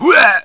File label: pain zombie